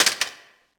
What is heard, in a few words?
Rifle Freeze; projectiles; Max Payne